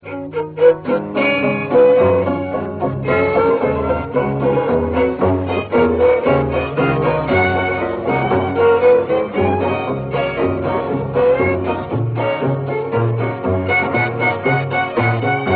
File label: violin